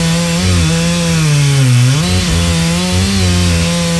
chainsaw active; khanat; varia cc0

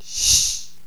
snake attack; snakes

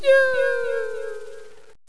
carpet die; khanat; megaglest

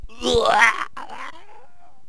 die, death, haaaaaa; voices; stickfighter

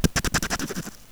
knife, blades; sfx

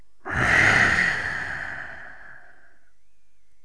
bull die; megaglest

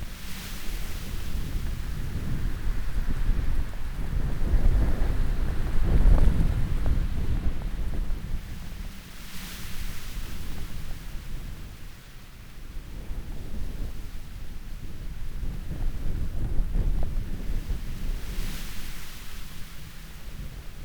forest amb

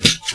archer attack, projectile; sfx; khanat

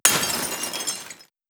window break big; khanat; varia cc0

